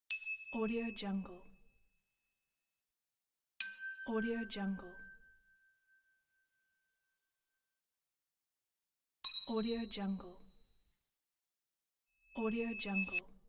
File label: ding